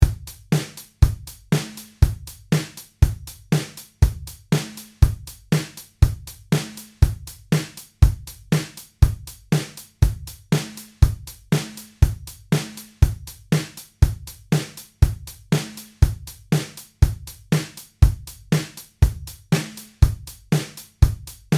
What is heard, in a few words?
Backbeat